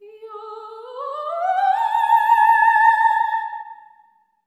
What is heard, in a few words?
LEGATO, Symphony of Voices; Spectrasonics